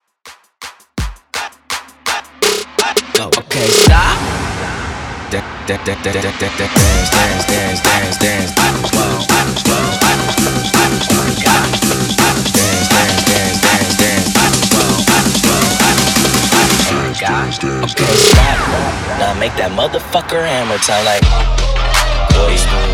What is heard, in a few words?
Epic Bass Edit 83 BPM